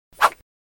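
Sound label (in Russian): звук взмаха; Звук ножа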